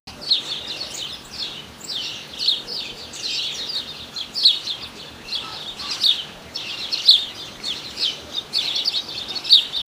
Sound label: birds chirping in village